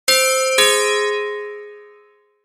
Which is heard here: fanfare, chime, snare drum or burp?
chime